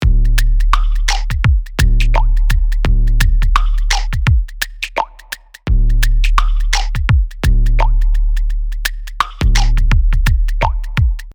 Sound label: beat active